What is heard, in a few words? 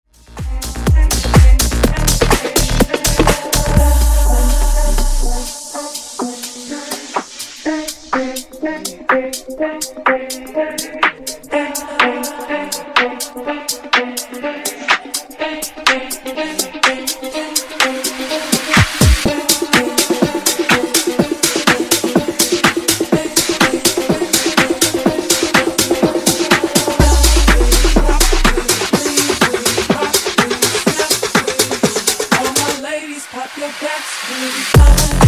Funk 124Bpm